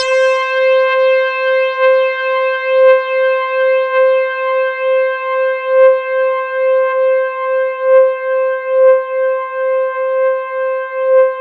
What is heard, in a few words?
C5, Synth Line; 90 s Sample CDs; NiceBeats; SynthLine VOL-30